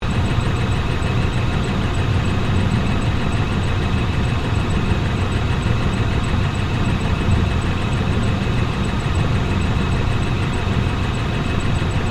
Industrial Fan Motor, Run, Loop; Squeaky, Wheel; Factory Sound Effects

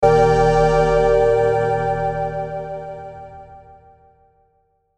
Hit Airy Vocal Reverb; Production Element Imaging Element Accent Transition

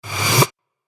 Metallic Rush Whoosh; sound design

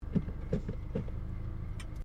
CAR SUBARU LIBERTY INTERIOR GEARS INTO DRIVE

